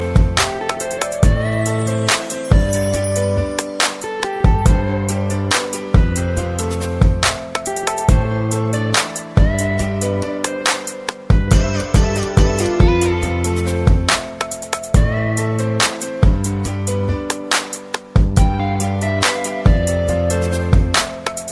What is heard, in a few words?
Hip Hop Remix